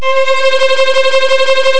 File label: violin